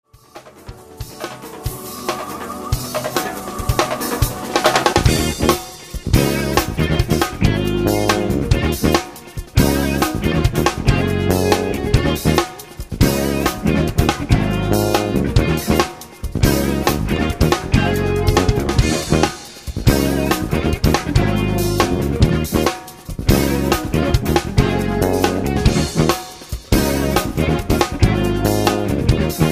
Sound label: planet jazz trecho da música